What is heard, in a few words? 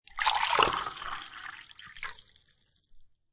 Pour Coffee Into A Coffee Mug; Pouring